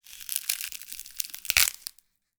Frozen, Celery, Crunch, Break, Ice, Sheet, Various; Mattia Cellotto; Crunch Mode